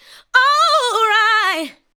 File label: ADLIBS